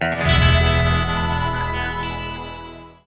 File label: Fanfar Win; Agents of Storm